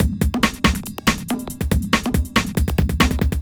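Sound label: industrial 140 kit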